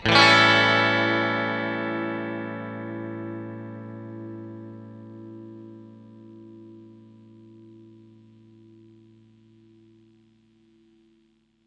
clean chord A, clean electric guitar chords

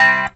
country chord, Guitars

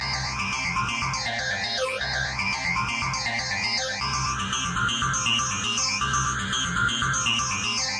120 Alien Chords Cmin Eb Bb Fmin; SYNTH AND ELECTRONIC LOOPS

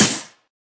SNARE, Volca; STYLE KITS (Hip Hop, Etc); WallsVado Volca Sample Pack

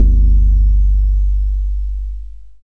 dx100 Timpani G2, Yamaha DX100; drum machines

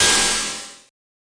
FM Cymbal, harsh; Harsh FM Cymbals

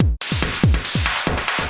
142 echoing smash noise loop; 141 to 160 bpm

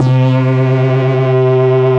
Synthesizer Middle C Notes, Lead; instruments multisampled; synth keys

